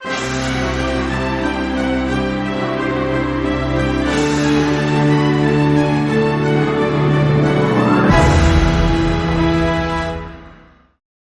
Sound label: Jingle; Serious Sam; War, Disco, & Extras; CroTeam